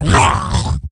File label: Murloc Warleader Death